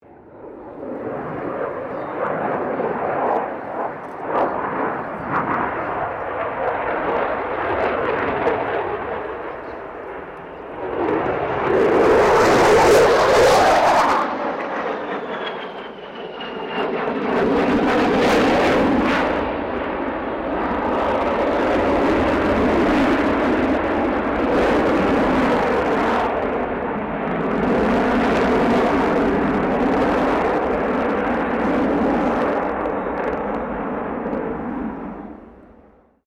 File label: AIRCRAFT FA-18 HORNET PASS